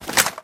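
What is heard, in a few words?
inventory items weapon; STALKER; IWP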